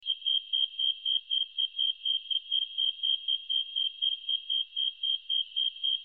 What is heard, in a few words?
Oecanthus fultoni hot; Arthropoda